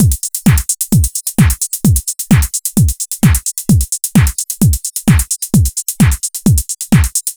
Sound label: 130 BPM, Digital Breaks; loops; Loopmasters; Jon Carter & Alex Blanco; Block Party Essentials